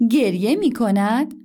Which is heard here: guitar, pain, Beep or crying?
crying